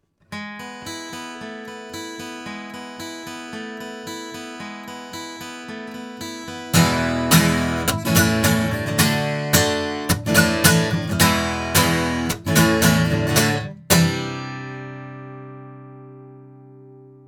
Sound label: ADK A51S Type4 guitar, microphones